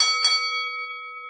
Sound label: double bell